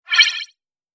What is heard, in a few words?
Vintage Magic Spell; Magic Sound Effects; Fantasy Sound Effect